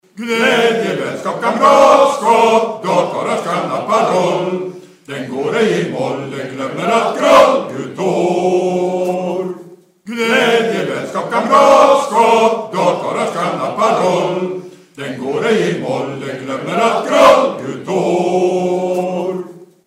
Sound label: snaps